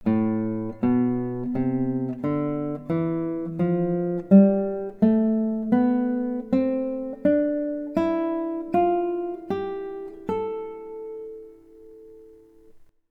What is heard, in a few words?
Natural Minor Scale, guitar